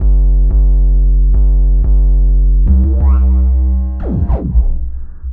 Victory Bass, Drum & Bass; Fluid Dynamics

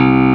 E.BASS C2; Big Fish Audio; Synth City

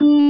KEY, Organs; Club-50 - Foundations Roland

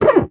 FRET, FINGER MUTE; Xsample VOL-1

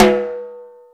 CM SNARE; Drums & Perc; AKAI S6000 CD-ROM - Ultimate

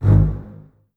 Basses G#1, String Ensembles; Miroslav Vitous; LINKS ORCH

